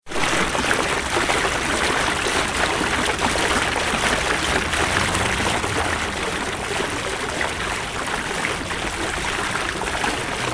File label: fountain traffic symphony at ottoplatz; koeln